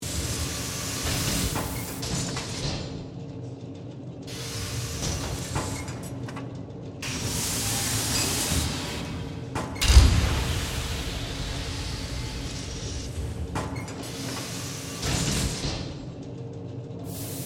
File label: Factory Machines, Science Fiction; Spacecrafts; Blast Wave